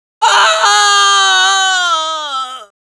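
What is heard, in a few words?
pain critical death, Spanish; Scout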